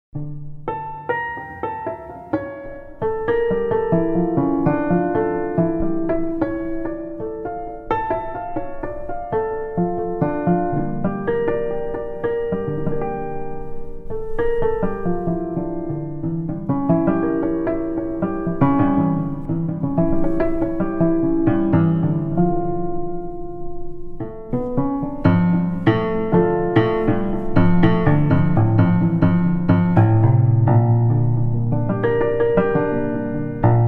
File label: Lutheal Piano, Harp Tiree Stop; Haendel; Praeludium; edition mim